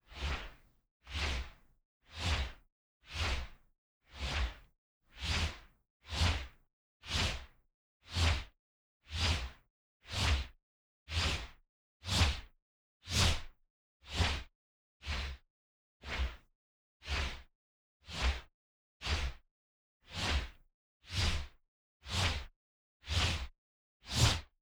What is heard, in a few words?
swipes and whooshes broom long stick slow and long swings stereo ORTF; Soundholder